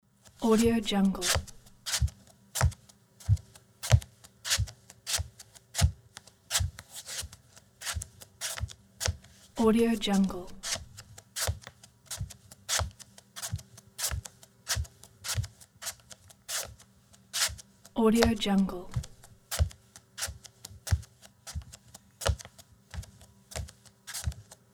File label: lazy barefoot footstep on parquet floor